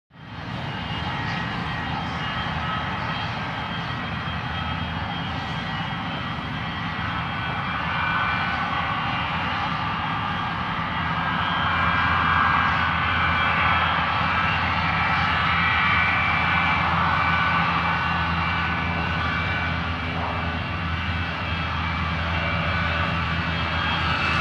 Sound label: Airport Runway Exter